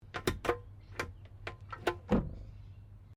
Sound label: Car Holden Fe 1957 Glovebox Open; Classic Australian cars; Vehicles